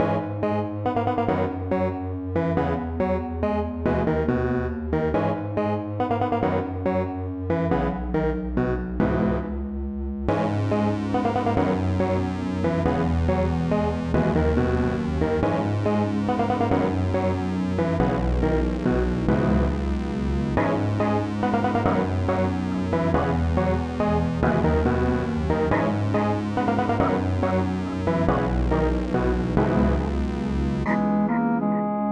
Merchants and Sea Salt, A theme that sounds similar to a port-town